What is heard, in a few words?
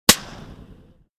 300 Blackout sniper rifle suppressed shot